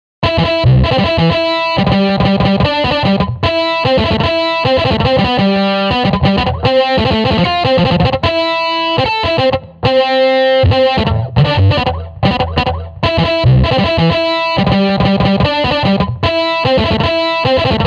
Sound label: eguitar